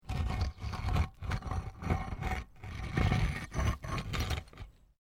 PAVING STONE MOVE, dragging

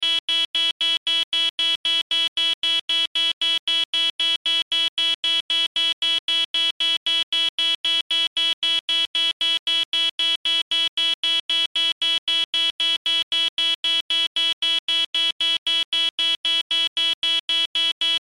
Sci Fi Alarm Buzzer Beeps Technology Readout Loop; Control Panel

